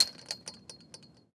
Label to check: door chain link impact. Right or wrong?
Right